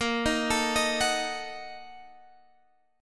A sharp Major 7th Suspended 4th Sharp 5th, Guitar Open F; arpeggiated; chordshape; 22,22,21,22,21,x